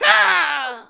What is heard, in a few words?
dead, monster